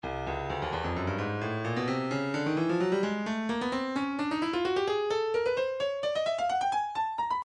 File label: breakbeat midi files 130 139 bpm 130; Stylus